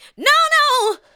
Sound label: ADLIBS